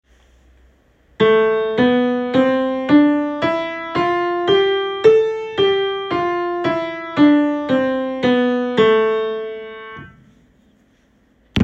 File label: Minor Scale - Starting A; all-state-chorus